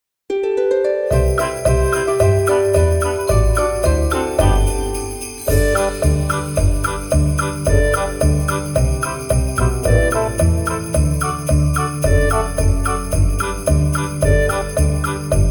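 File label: Jingle Bells instrumental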